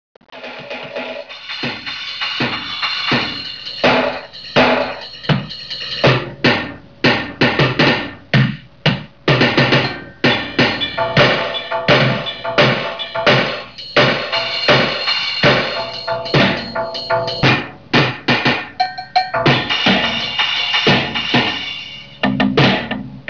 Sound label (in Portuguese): bateria